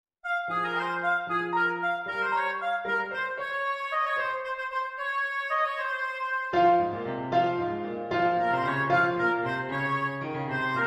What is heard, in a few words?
oboe Vignette